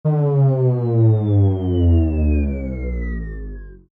Power down, Power Down Of Scifi Electronic Device Or Machine; Control Panel; Stuart Duffield